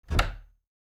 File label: closet door open